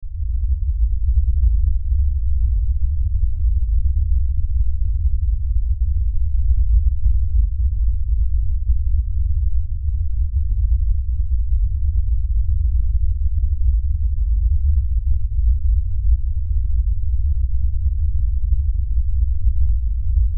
LFE Rumble, Lfe Rumble Low Bass; Blastwave; Sound Design

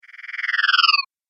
Magic Power Down; Magic Sound Effects

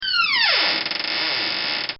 Door Squeak

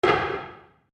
Wood On Wood Floor; Material components for constructing more complex sounds; Sound Effects:Materials

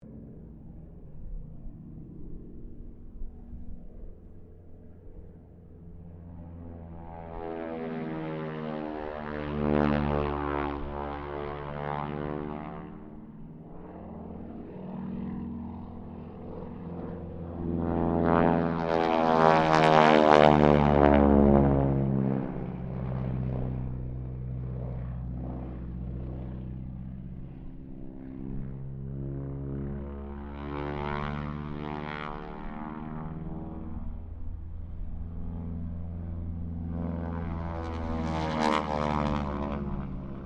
Harvard squadron 4 pass, stereo; Mixed aircraft squadrons; Airplane; Airplanes; Vehicles

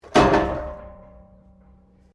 PIPES METAL IMPACT METAL; Sound Effects:Materials

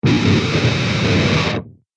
Scream Total Distortion; Screaming Sound Effects

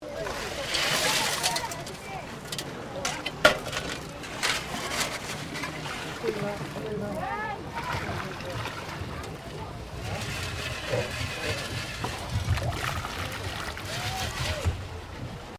PANNING FOR GOLD, searching for aluvial gold; Shaking (Sound Effects:Objects)

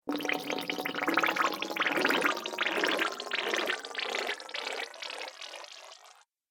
Water Bubbling Echoes, cartoon

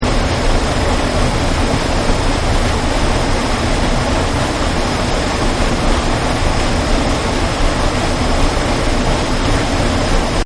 Waterfall Bali; Rivers And Streams; Partners In Rhyme

